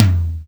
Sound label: Toms